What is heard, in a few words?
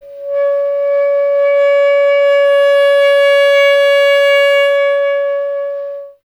B. Clarinet